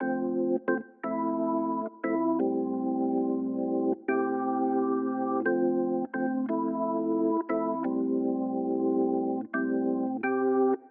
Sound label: Classic Melody Loop - 88 BPM A Min Organ; Cymatics; Oracle Sample Pack